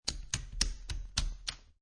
Poker Chips Stack Chips On Top Of Each Other

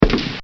Rifle Single Fire; Guns; Bobby Cole

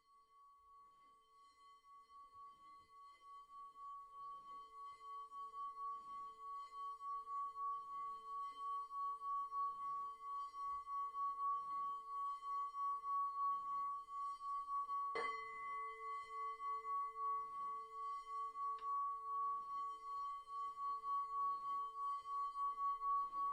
Panic Bowl, Tibet original rare bowl; Panic Bowl Tibet original rare bowl used to cleave the brains or dissipate the lives of little evil Tibetan beings the low sou; Miscellaneous Musical; Tom Hutchings

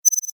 multimedia rollover, Multimedia Rollover Tone

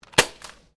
GUN TOY DART PISTOL FIRING; Sound Effects:Toys

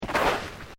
Footsteps Boots Sand Step

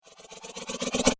Sci Fi Build Up Abrupt End; Science Fiction Sound Effects; Andrew Fleming

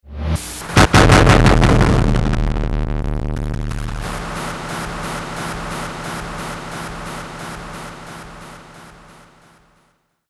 Whoosh Hit Static Distortion Delay; Blastwave; Whoosh Sound Effects

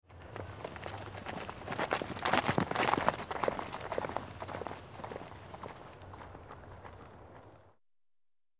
Horse by group, Horse Group Walk By; Small Group Of Horses Walk By On Gravel , Noisy Saddles; Animals

